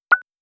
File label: screen bip; seeding-planets